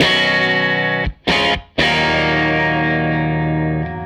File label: Tele Guitar Loop